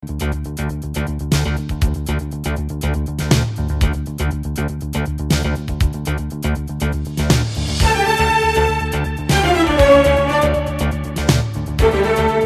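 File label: Toccata Fugue in D minor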